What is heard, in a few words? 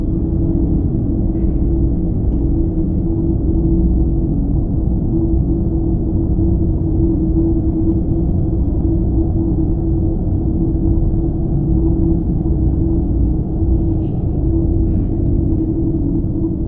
juggernaut plantoid idle; Stellaris; federations